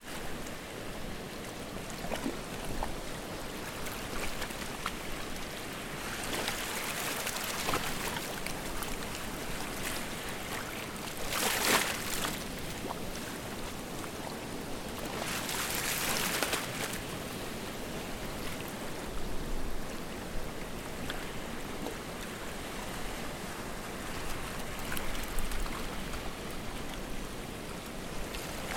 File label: nature ocean distant surf small waves lap around rockpools beach